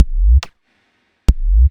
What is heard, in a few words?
Beat Key